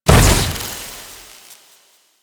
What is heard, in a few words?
Object Blind Explosion; Warframe; Warframes; Harlequin